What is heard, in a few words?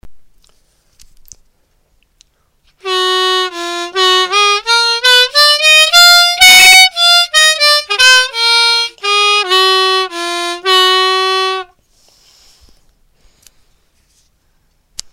Harmonica Huzam